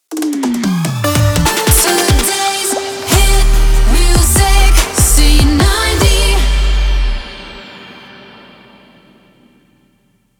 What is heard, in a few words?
Jingle 145bpm Shotgun